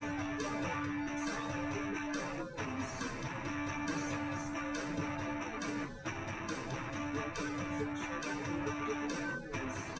test wdrums liverec 250ms 1m src; SiSEC08; SiSEC underdetermined

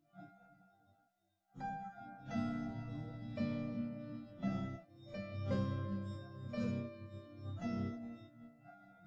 nodrums, live rec

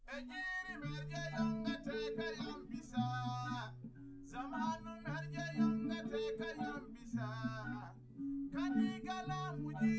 nodrums liverec; SiSEC10; underdetermined

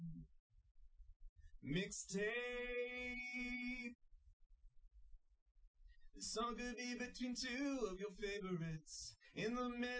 wdrums liverec; SiSEC08; SiSEC underdetermined

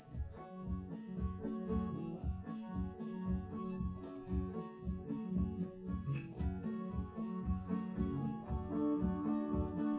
drums live rec 250ms 5cm; SiSEC11; underdetermined

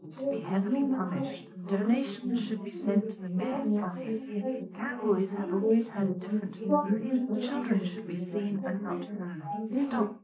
female, 380ms 4cm; SiSEC11; underdetermined

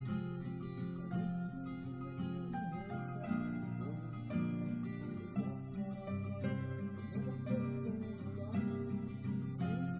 nodrums liverec 5cm; SASSEC07

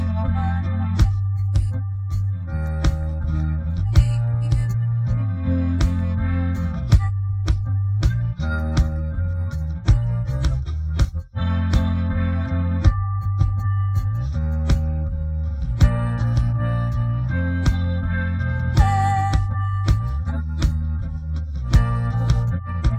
bass; SiSEC11

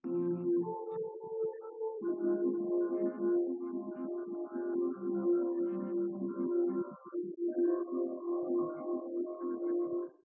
nodrums; SiSEC10; underdetermined

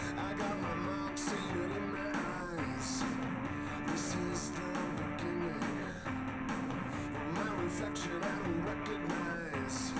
wdrums liverec 250ms 5cm mix; SiSEC10; underdetermined

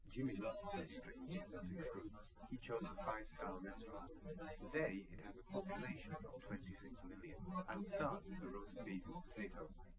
male liverec 250ms 5cm sim; SiSEC08; SiSEC underdetermined

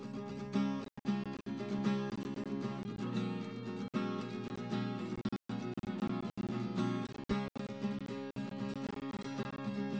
nodrums inst sim; SASSEC07

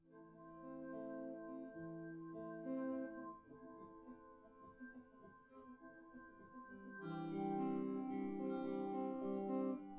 nodrums synthconv

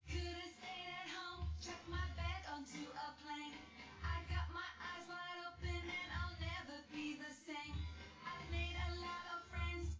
wdrums synthconv

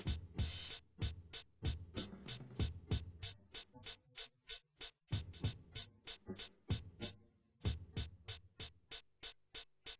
drums inst; SiSEC08; SiSEC underdetermined

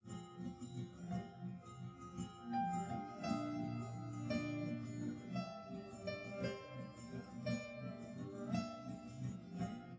nodrums liverec 250ms 5cm sim

